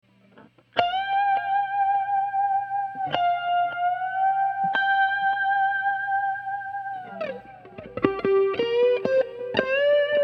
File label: clean intro, Strat, neck pickup; EMG